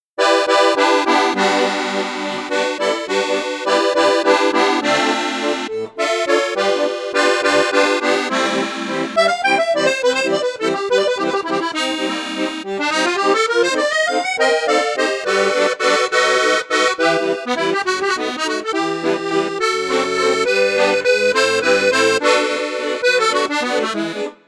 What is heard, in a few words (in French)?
registre flute juste flute haute, accordeon; mengascini mini retro